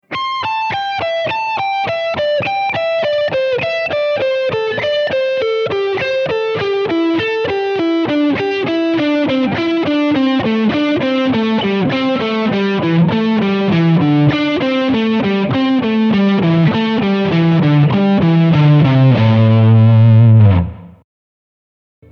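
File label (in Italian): Pentatonica Orizzontale discendente, chitarra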